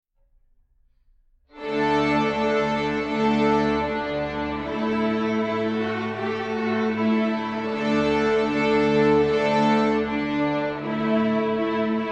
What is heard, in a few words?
barn dance